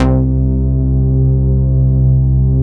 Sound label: DANC, synthesizer bass; Synthesizers; Soli-Music; World of Synthesizers VOL-1